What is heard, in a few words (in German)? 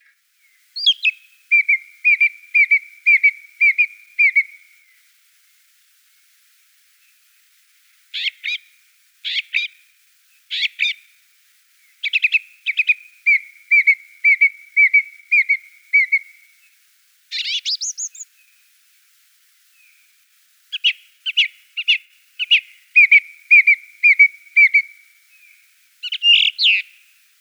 Singdrossel Gesang; uni-kl